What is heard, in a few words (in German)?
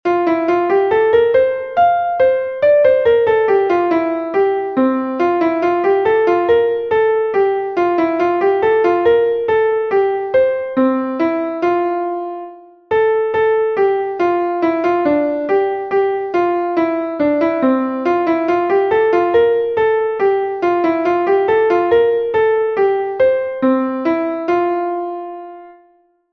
klavier; MelodieMeister